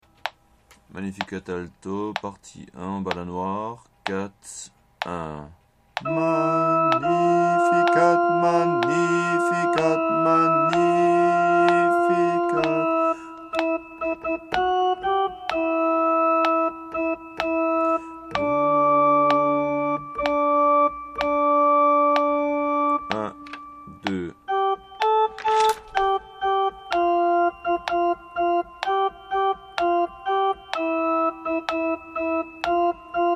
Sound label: Alto; Pachelbel; Magnificat